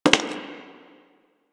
Human Loid Foley Step; Warframe

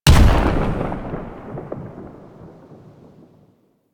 Ship Primary Cannon Proj Explosion A; Weapons; Warframe; Railjack

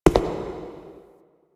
Shadow Figure Steps; Warframe; The New War; Zariman Shadow

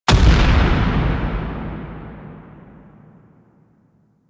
Spawn Landing; Enemies; Warframe; Kela De Thaym

